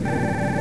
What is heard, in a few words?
Mild Alert; Rescue! 2.0.2